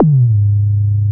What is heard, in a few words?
TOM MID; Back In Time Records; Big Bang